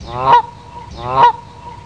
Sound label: Canadian Goose; west lake; Ariel Foundation Park